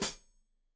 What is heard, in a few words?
Hat Foot, Hi-Hat, Cymbals Stereo; Deeply Sampled Drums